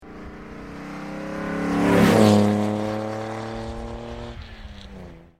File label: mercedes 300 sl